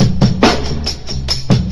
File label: bass cut in, Drum loops, Boombap